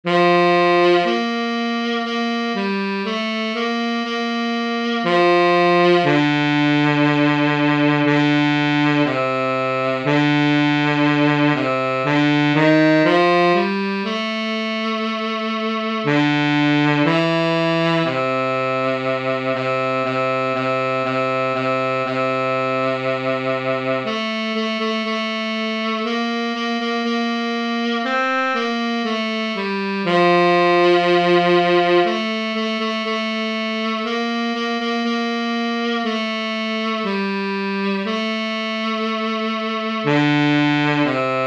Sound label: tenor sax